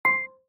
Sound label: GLASS LISTENING